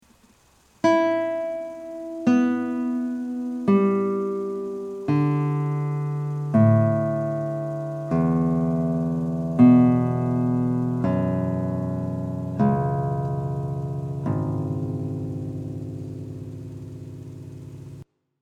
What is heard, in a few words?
TEN STRING OPEN TUNING